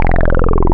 Filter FM Hi Q, wavetables; Animoog

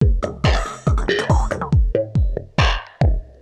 Throatbeat

